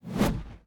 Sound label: atk axe